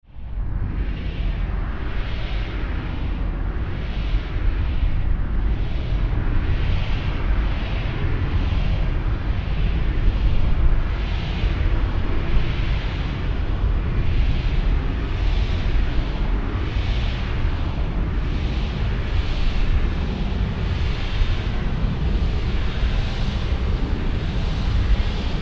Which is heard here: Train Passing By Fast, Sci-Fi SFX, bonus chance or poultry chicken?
Sci-Fi SFX